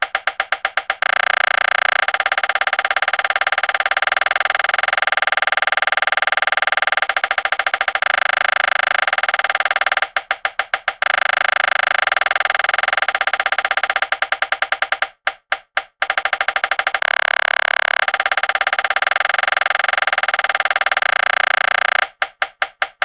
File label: clicks